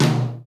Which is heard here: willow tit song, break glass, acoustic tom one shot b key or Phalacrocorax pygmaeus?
acoustic tom one shot b key